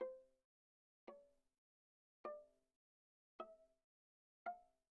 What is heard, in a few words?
Viola pizz sul G pp C5 F5